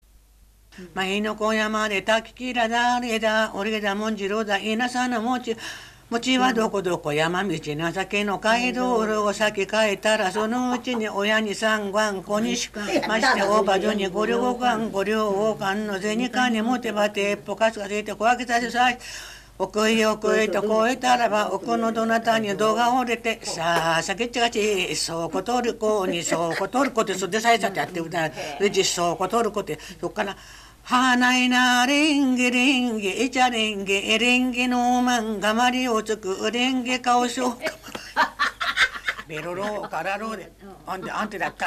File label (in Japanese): temariuta, minyou